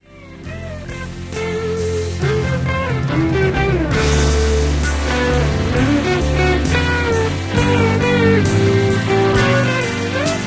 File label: guitar solo